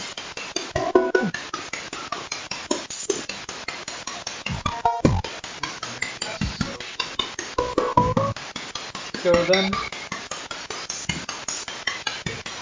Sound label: Train Station; Mars Train Station – Return Investigation 2024